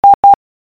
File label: I morse code